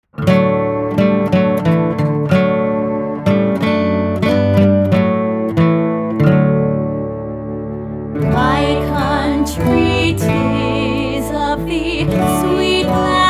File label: my country tis of thee vocals